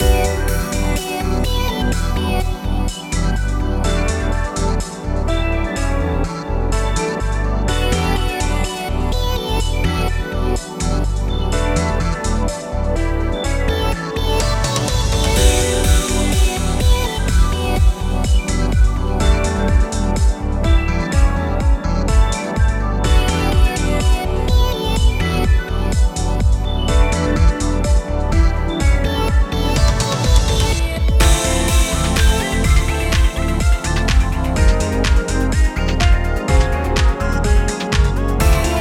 Goal 125bpm; 192beats

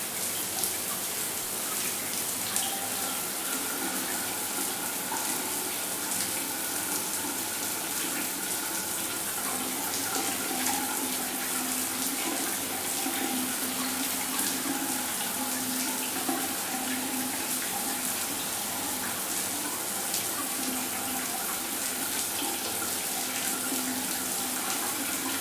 Shower; Sunrider: Liberation Day

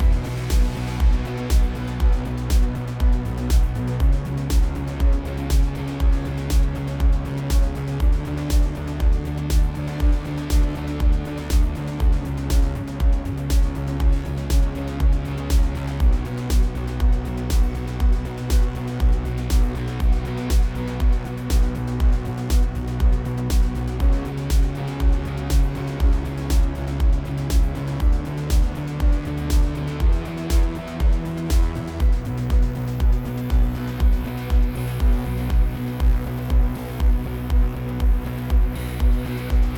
Dash 120BPM

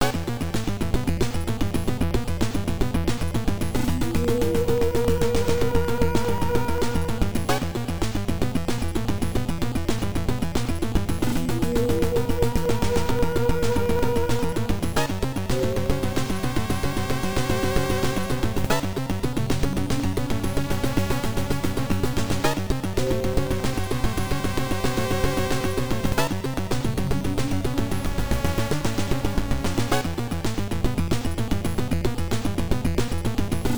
Warning; Level 3 Warning (Alert Mode Level 3); metal gear 2 solid snake; msx

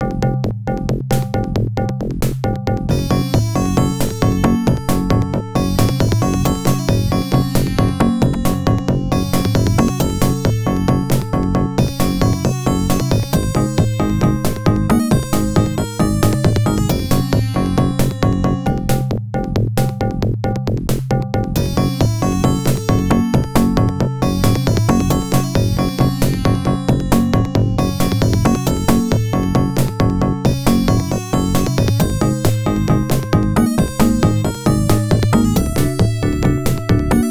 Loop; Kombat Tomb; Mortal Kombat II

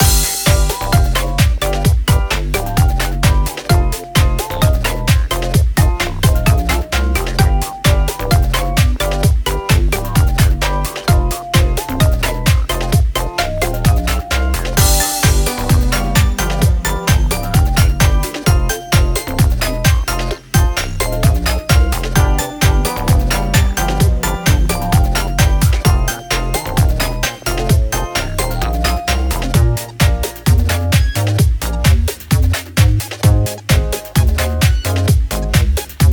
Mid Beat; A-ka Best Complete Sound Box 2013-2017; ブレイクスルー

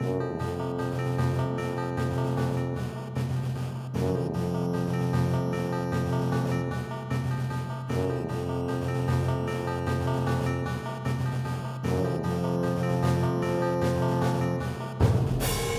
Star Chords

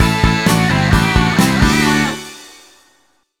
Reward Unlocked; Cars Mater-National Championship

